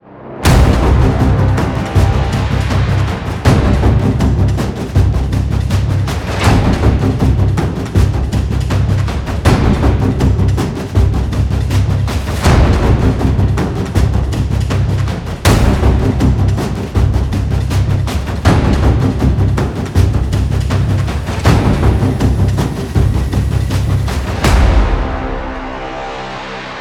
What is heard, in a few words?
tension project violin; gerilim; By Burak Sound Bank; tension gerilim vol 12